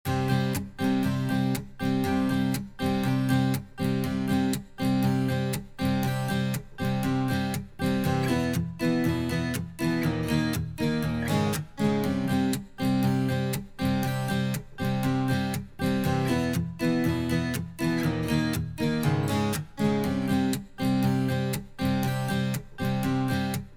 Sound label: Strummed Acoustic; ohne Bearbeitung; Zynaptiq Unmix Drums; Native Instruments Session Guitarist